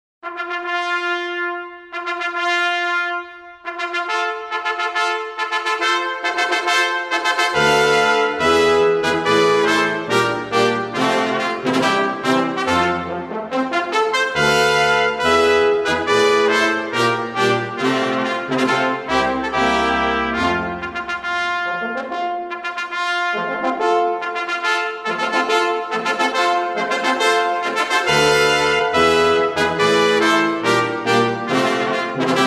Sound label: Wedding March Recessional